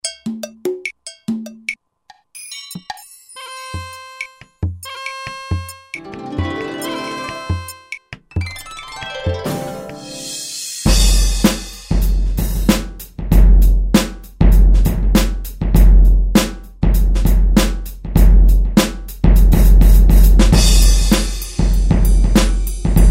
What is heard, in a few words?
Celtic Set, Roland SR-JV80-16 Orchestral II